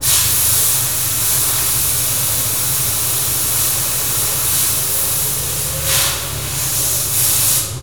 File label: Air blower; INDUSTRIAL